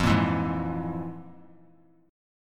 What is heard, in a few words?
F Minor Major 7th Double Flat 5th; FmM7bb5 strummed; JGuitar